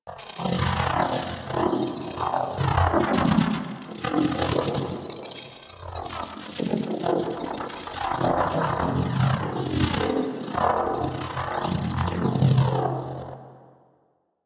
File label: Energized Loop; DE; Warframe; Warframes; Gyre